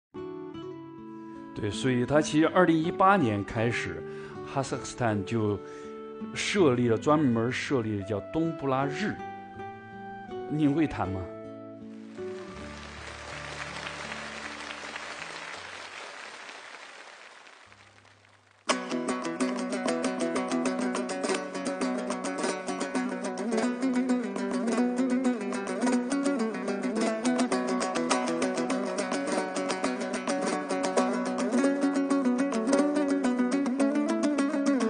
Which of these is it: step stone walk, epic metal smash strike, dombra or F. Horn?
dombra